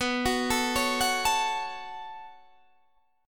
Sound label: B 7th Suspended 2nd, Guitar, Open D